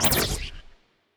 evasion buff projectile, weapons; Stellaris; biogenesis

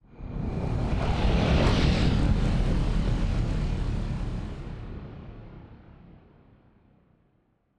ship colony ship move; Stellaris; machineage; ships

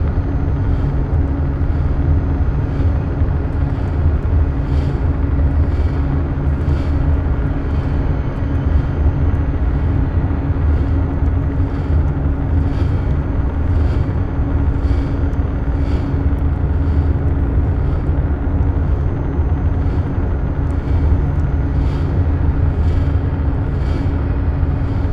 transport ship hum; nemesis; Stellaris

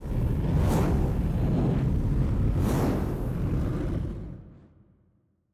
kaiju superweapon fire swirl; biogenesis

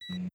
incoming ai message beep; Stellaris

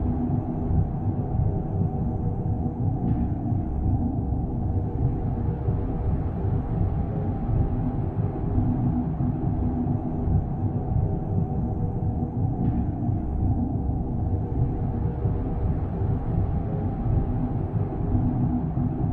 ship colossus idle; Stellaris; machineage; ships

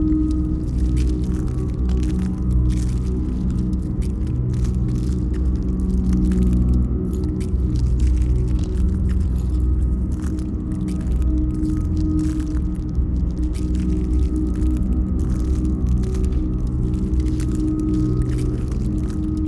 ship starbase idle; Stellaris; biogenesis

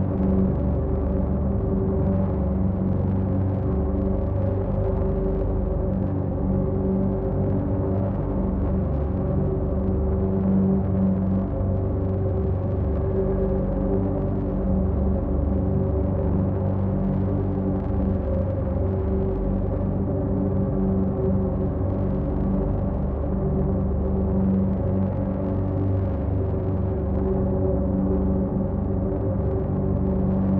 psi ship titan idle; ships; Stellaris; shroud

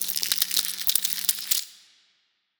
small legs up; biogenesis

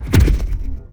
shield hit; weapons; Stellaris

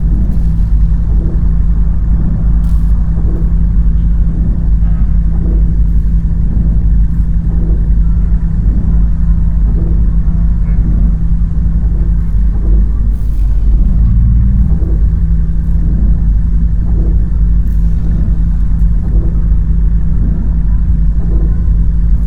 molluscoid colossus idle; apocalypse

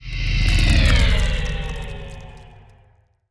pro ship juggernaut move; biogenesis

